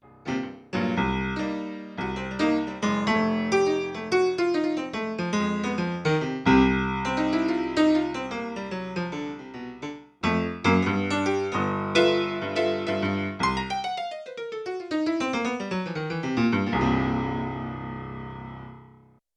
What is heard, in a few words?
61 Key Dynamic Low, Yamaha CFIII Grand Piano; Yamaha TX16W